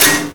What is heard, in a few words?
match not ready; summer pockets (pink)